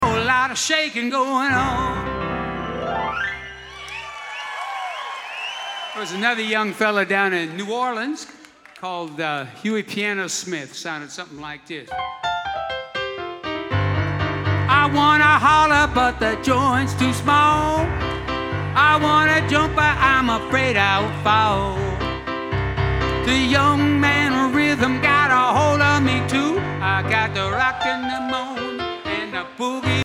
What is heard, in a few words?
Piano Moment